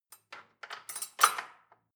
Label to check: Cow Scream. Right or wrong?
Wrong